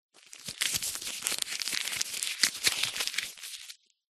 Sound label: household band aids plasters in paper wrappers several movements in hand; zapsplat